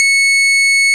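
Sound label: Percussive Organ G6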